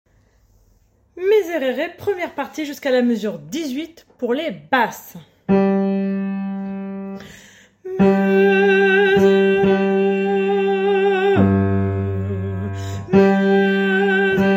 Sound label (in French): basse